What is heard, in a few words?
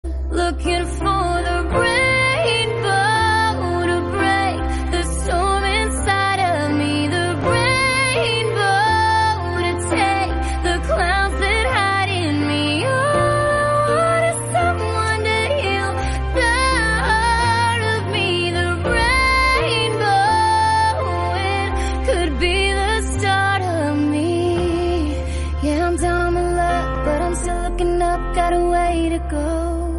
rainbow ballerina english song